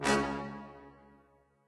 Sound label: fire